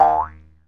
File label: socials; comms